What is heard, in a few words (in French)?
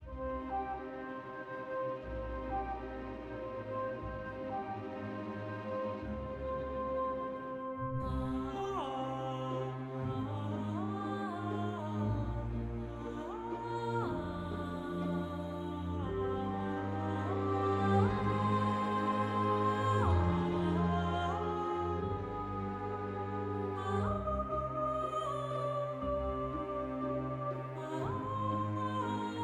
orchestre audio avec voix; orchestra e voci sintetiche